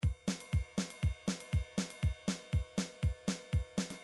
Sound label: midi drums